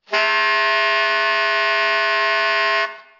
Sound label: multi, sax